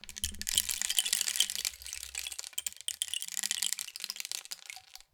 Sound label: Rain Stick, Percussion Samples